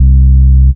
Bass Shot C

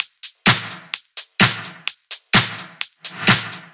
Loop, 128 BPM

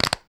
Clap, Dubstep One-Shot Drums

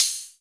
Open Hihat; Mutekki Media; Vengeance Electroshock Vol.2; VES2 Cymbals

